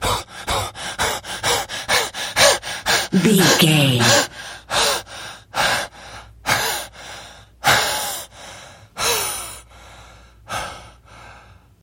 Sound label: voice male breathing sequence long